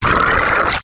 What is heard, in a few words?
dragon die; dragons; khanat sounds sources